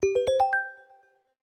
Key Folder Open; LG Wine Smart

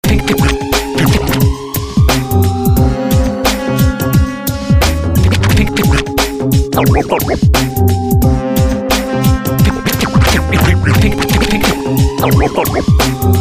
scratchin; Kyocera KX5 Slider Sonic Virgin Mobile; ringer

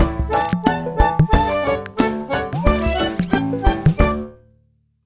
Dadgad; Sony; Xperia Movie Creator Music

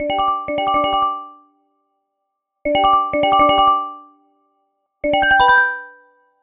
Spaceship, ringtones; Google Pixel 4; Pixel Sounds

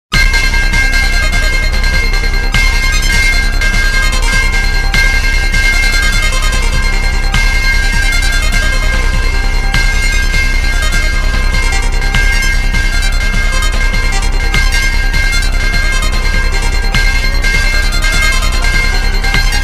Electric Rain, Ringtones Electronica; SKY; IM-U310K

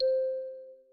Class Electronic, notifications; OnePlus 11

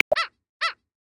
Pomarine Jaeger, Polar Region; Xiaomi Redmi-Note-8-Pro

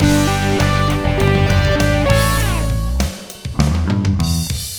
Classic Pop, ringtones; Google; NewWave Labs

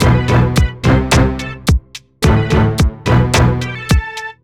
Hiphop Rnb, ringtones; Bentley Dubs; Google; NewWave Labs

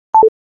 video record stop sound; BlackBerry OS-10

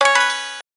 gayageum, keytone

